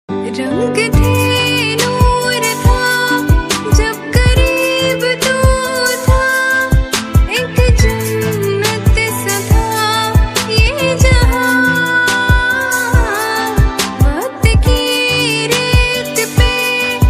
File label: Most Sad Hindi Song